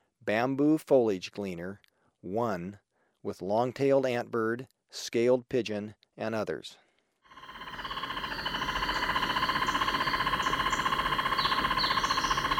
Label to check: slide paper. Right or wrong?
Wrong